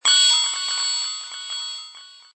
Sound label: Sci-fi. Robot activated, computer panel; information received and displayed; Lynne Music